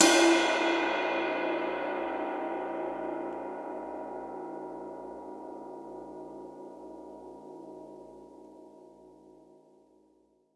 Ride

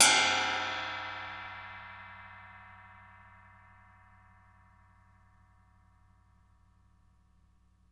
ride lo

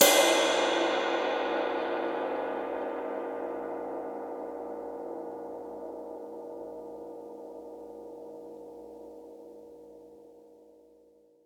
Ride

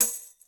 tamb hit

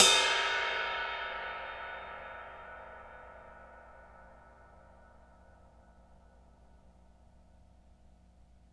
ride Hi

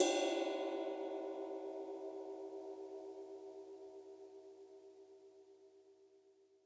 Ride

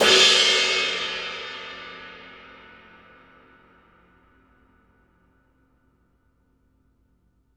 ride Hi

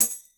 tamb hit

